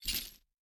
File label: chain